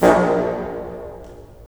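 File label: wind bassoon; LA Composer vol. 3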